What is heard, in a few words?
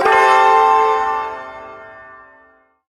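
Shawzin Major Chord; Maj Chord K; Warframe; Duviri Ersatz Shawzin; Chords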